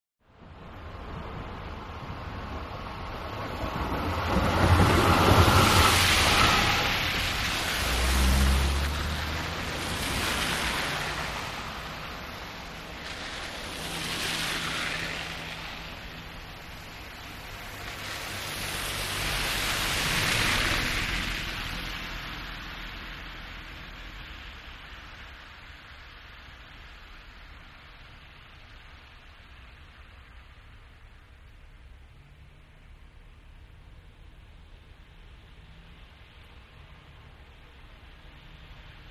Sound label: Wet Slushy Traffic By